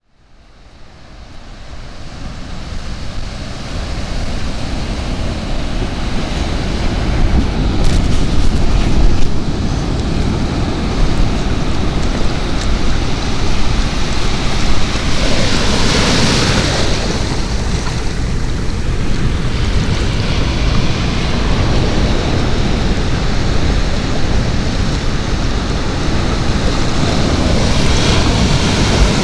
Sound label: Atlantic City Ocean Waves; New Jersey Shore Beaches